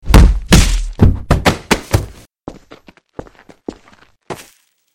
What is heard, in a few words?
footstep